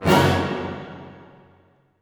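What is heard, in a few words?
orchestra; Masterbits - Switch FX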